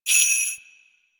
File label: Sleigh Bells Single